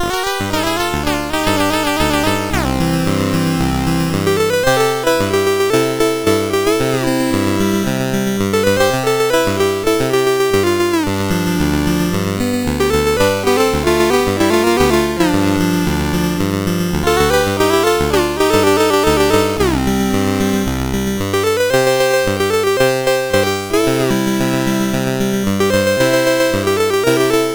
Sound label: CSG8580; CSG8580R5